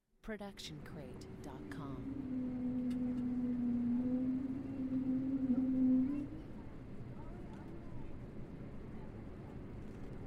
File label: interior airplane takeoff child crying mom shooshing; soundscrate